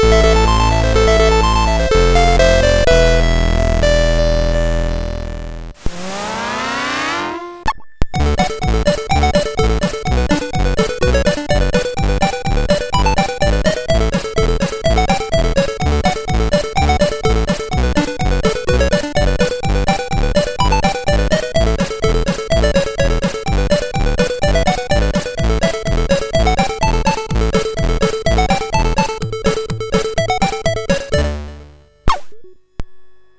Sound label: tune, MOS6581R3